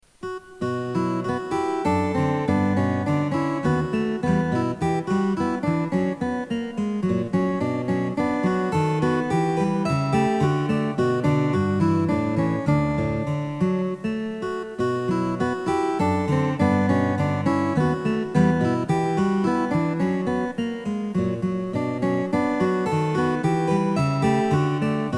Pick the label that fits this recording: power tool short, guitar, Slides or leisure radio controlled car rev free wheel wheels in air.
guitar